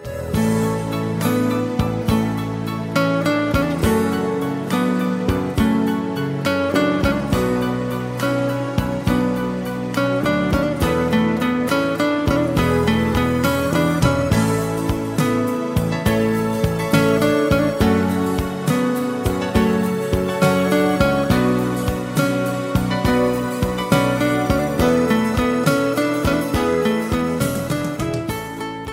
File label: guitar ringtones